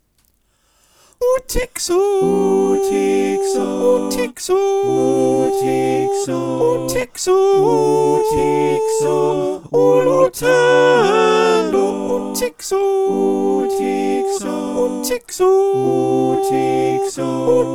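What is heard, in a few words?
soprano, choir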